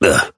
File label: pain small; Operative